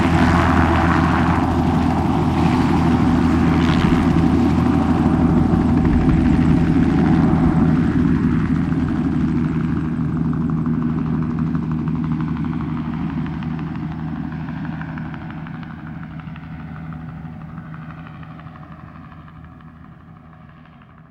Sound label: COPTER TAK, Helicopter; SFX